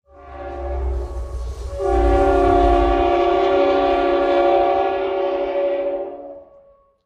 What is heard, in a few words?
train 1shot, harbor; Source Engine; tf; cp harbor